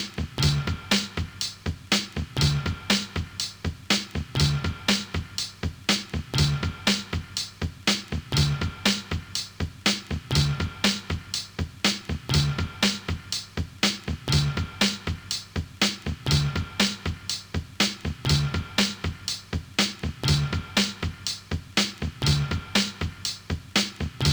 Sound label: break 121bpm